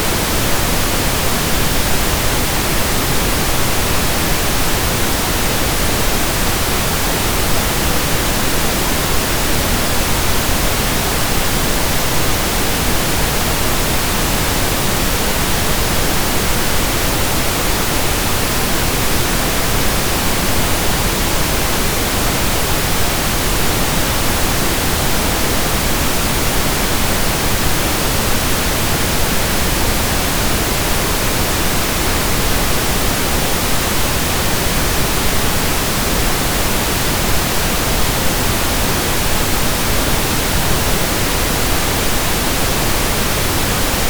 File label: Pink Noise; 24 Bit / 48 kHz, FLAC